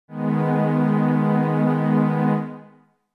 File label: Ab Minor